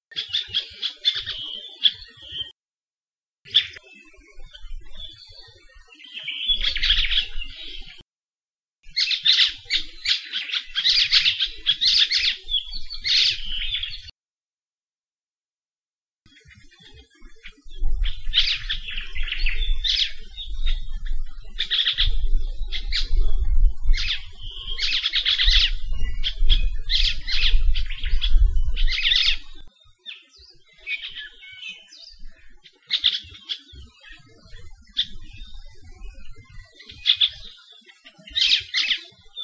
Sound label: elepaio